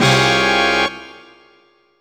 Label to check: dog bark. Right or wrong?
Wrong